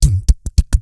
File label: BIG CHICK, Vocal Blues Soul; Spectrasonics; Vocal Planet